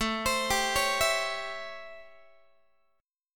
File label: A 7th Sharp 9th A, Guitar; A7#9 arpeggiated; x,17,15,17,17,15; 4A,4E,5A,5D,5G#,6C#